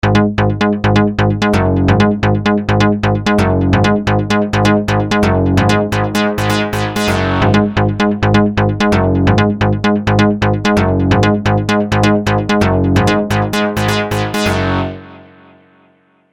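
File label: SQ80 Bass Sequence velocity to VCF Q Delay; EIII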